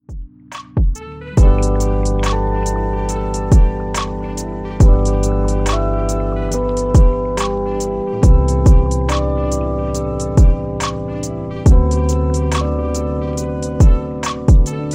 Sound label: iphone ringtone